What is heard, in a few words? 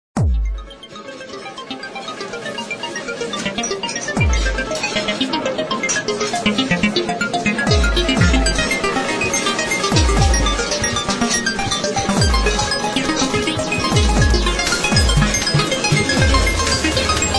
modern china jazz